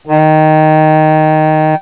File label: tone